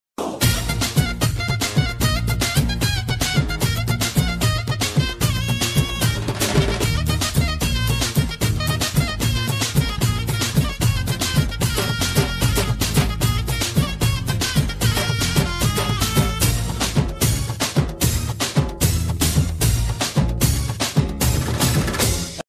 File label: bgm; Shankar Dada MBBS; Chiranjeevi; Srikanth; Paresh Rawal